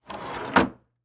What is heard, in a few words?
Drawer Close Hotel; Haunted Bloodlines; SFX Producer